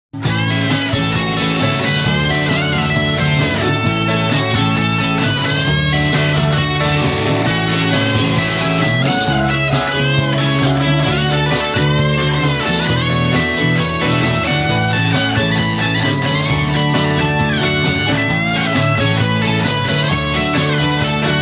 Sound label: Country Alarm